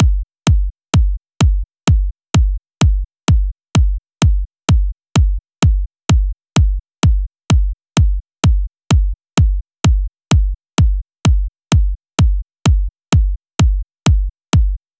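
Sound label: Drum Loop 128BPM bass drum; VEC5 Drumloops; VEC5 Multi Loops 128BPM; Loop Elements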